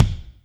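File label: kick Amb 24', Alesis D4; 300 Drum Machines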